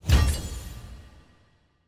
lobby notif invite granted; parties

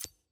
dropdown select

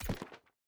ui journal close button click

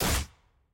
skill hit; sett skill 1

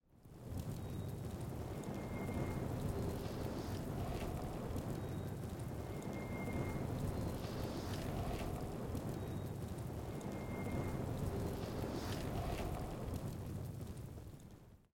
hover; lol; eternals

